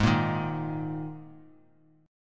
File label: G sharp Suspended 2nd, G sharp, down; G#sus2; strummed; JGuitar